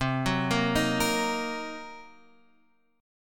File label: C Minor 7th Flat 5th Guitar Half Step Down, chord